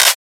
trap clap single shot f sharp key, Royality free clap sound clip tuned to the F# note.; single hits one shots